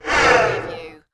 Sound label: Alien Breath; sound effects bible